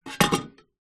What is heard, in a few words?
household bread maker panasonic sd 2501 tin place in